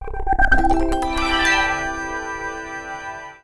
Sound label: Jingle Puzzle Solved; rayman 3 hoodlum havoc gc ps2 windows xbox 2003